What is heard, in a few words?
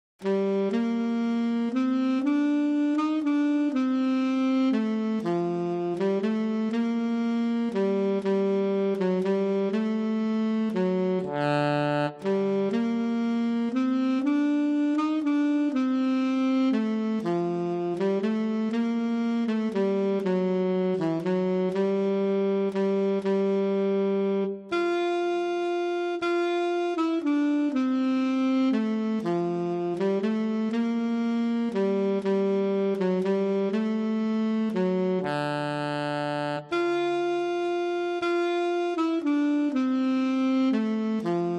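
solo Alto Saxophone; Greensleeves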